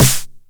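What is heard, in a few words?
909 SD Tape, snare drum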